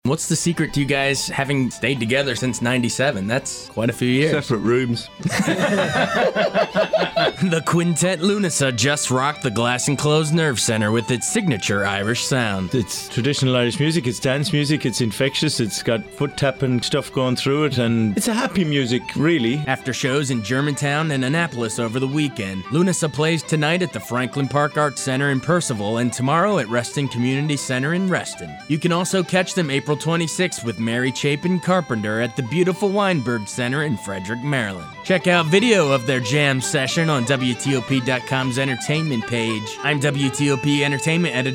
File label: IRISH MUSIC